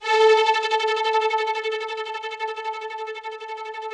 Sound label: ROUGH STRNGS, Yamaha VP-1; Music Store